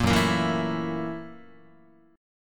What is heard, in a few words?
F sharp 7th Sharp 9th F sharp down Guitar; strummed; JGuitar